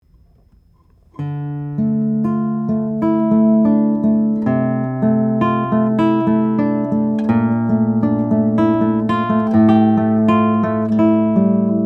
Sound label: Goodall RXC, guitar; indian rosewood; german spruce